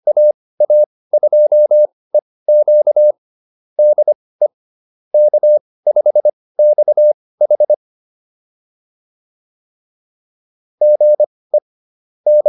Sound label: QSO, intermediate practice files